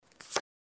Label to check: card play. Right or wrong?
Right